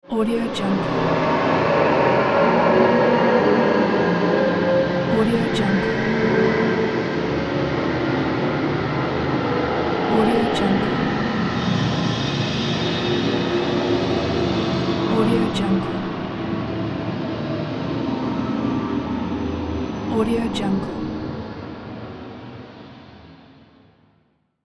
horror ambience

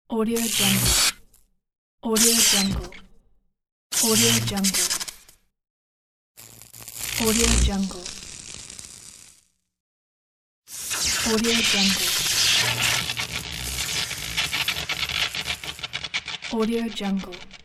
electrical discharges are short

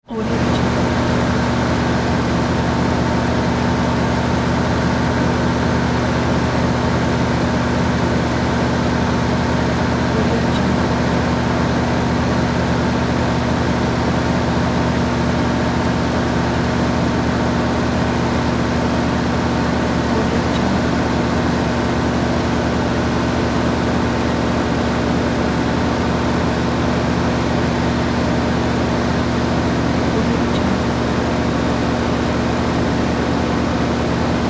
school bus high idle

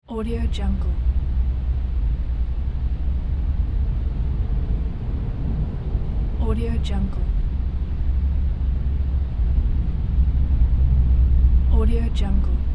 room ambience

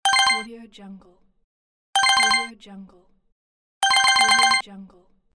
game points

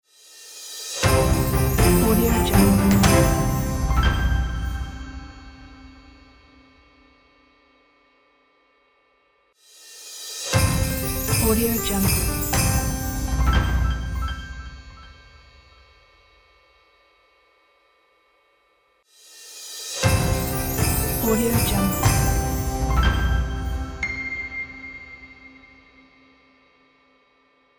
christmas logo

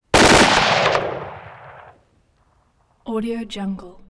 assault rifle; prosoundfx

